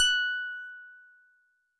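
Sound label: Solid Bass DX100 F#4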